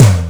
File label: Tom Room